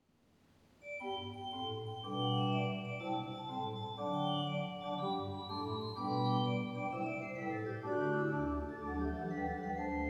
flutes 8 & 2 great choir solo, Flutes 8 & 2 Choir alternates with Great, and Solo provides echos. The Great is real pipes; phoenix